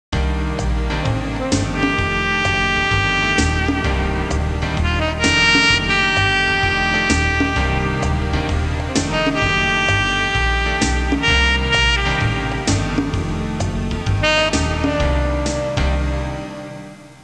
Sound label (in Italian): suonerie musica jazz